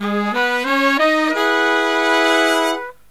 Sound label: Killer Brass Riffs; USB Soundscan vol.29; AKAI